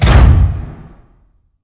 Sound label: pod door sm verb close stop; Titanfall 2